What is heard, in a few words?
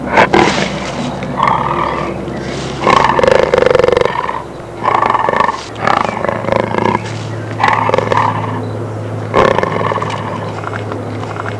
jaguar